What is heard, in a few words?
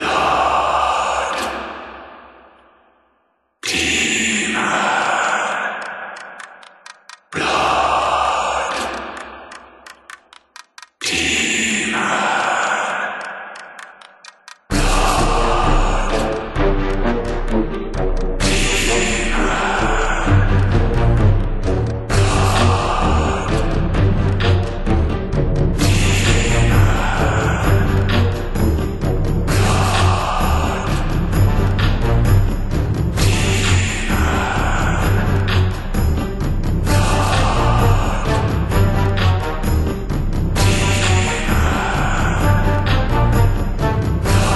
bossfight; hazen the dark whispers